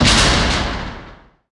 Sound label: Gear Teeth Hit; moul